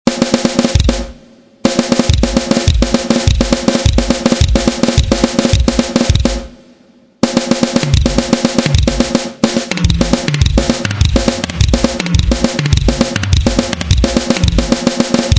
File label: fill